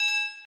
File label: count Up End; netent; piratefromtheeast